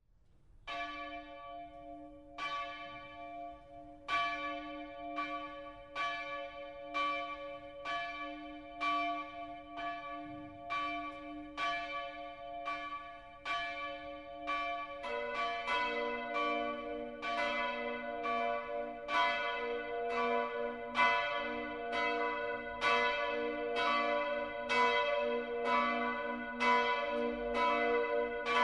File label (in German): glocken